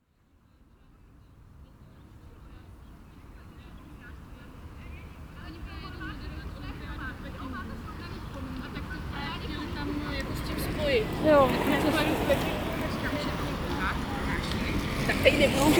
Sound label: Brevnov Skaters, field; Prag Field